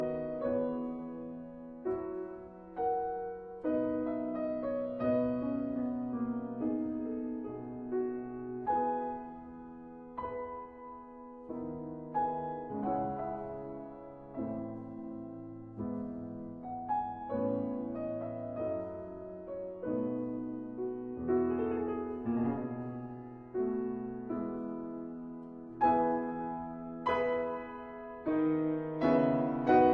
Sarabande in E major; Rameau

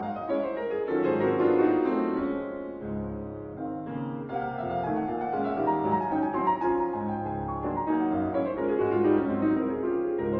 Waltz in D flat major; Chopin

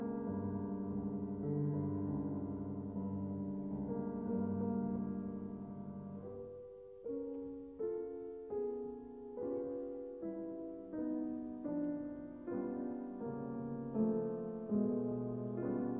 Piano Sonata in F major; Op 68; Black Mass

